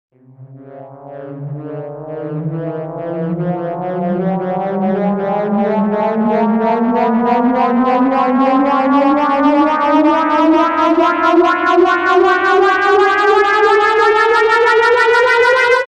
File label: Funny To Freaky; Tention Makers; RoosterFX